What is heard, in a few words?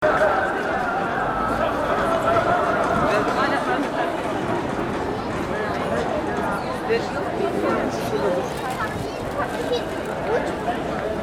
galata tower prayer; readings and soundscapes; refugeehosts